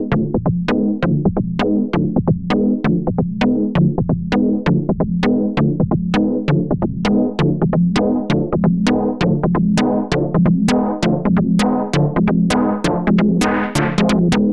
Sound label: 132 Bpm Techno Chord Sequence